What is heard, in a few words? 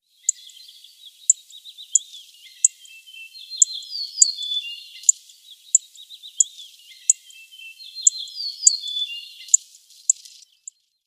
Yellow-breasted Bunting calls